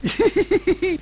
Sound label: Homer Hihihihi; simpsons